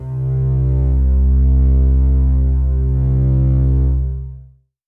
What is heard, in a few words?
Synth Prophet Bass C; Electronisounds